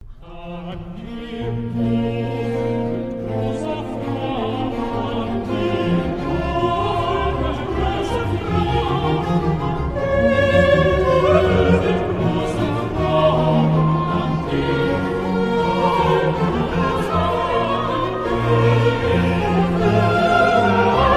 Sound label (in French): cantate, choeur; BACH; bwv 198 TrauerOde